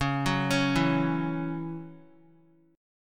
C sharp Minor Double Flat 5th C sharp Guitar; C#mbb5; arpeggiated; JGuitar

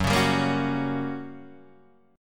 G Minor Major 7th Flat 5th F sharp

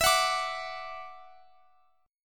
D Major Add 9th E, chord; Dadd9/E; strummed

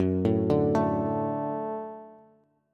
Gb Minor Sharp 5th Gb Bass; arpeggiated; JGuitar

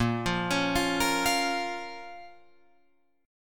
A sharp Minor, Guitar Open F; arpeggiated; JGuitar

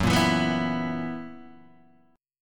Gb 11th, Guitar, DADGAD

